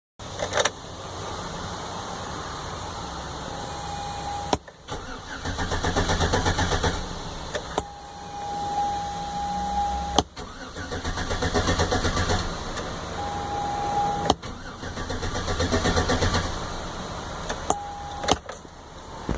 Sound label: sierra cranks 3 seconds but wont start; gmc